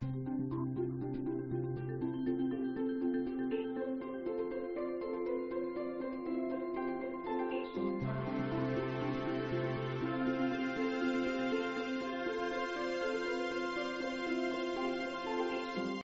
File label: level win; ost; gardenbloom